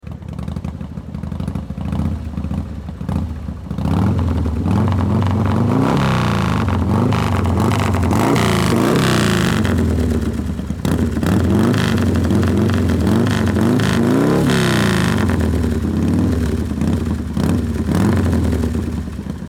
motorcycle Fat Boy straight pipes tuned engine parked far behind pipes revs; throttling; Anarchy; 100year